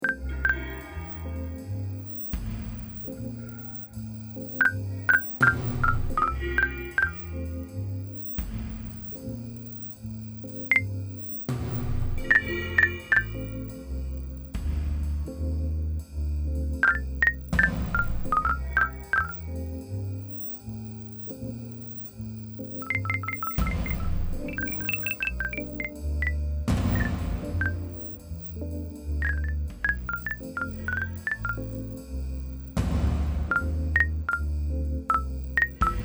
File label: MIDI blues; spellman studio